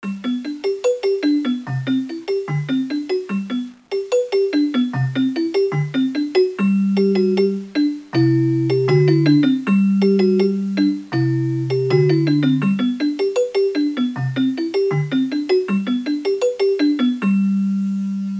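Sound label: xylophone; My Science Fair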